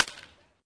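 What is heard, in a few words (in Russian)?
звук удара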